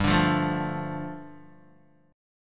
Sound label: G 7th Suspended 2nd, Guitar; strummed; JGuitar